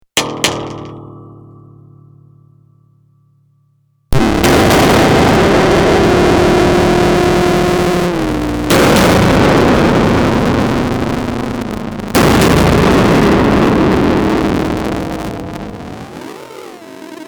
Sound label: metal